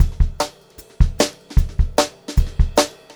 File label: Brushed Drum Loops; USB Soundscan vol.39; AKAI